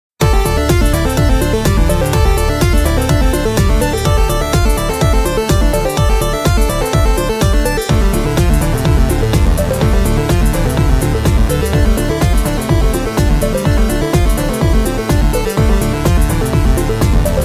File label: Combat Synth; Temple of Brainstorms (Combat) [Synth Ver.]; Cadence of Hyrule